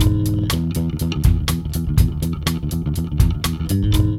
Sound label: LOOP